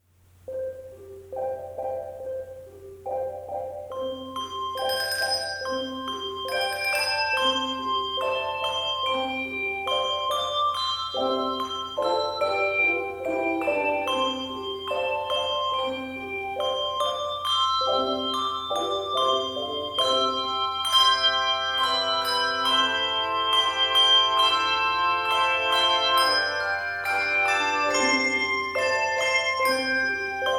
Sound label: Handbells; How Firm A Foundation; Composer: Traditional Carols